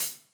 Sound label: hi-hat